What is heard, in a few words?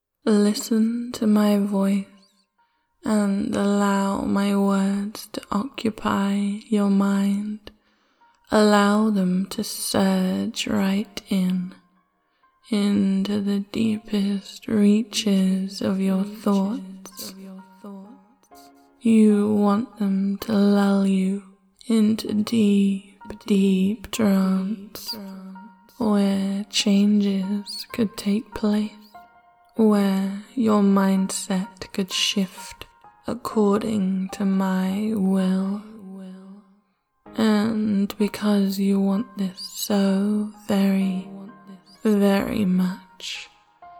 Deep Trance Loop Music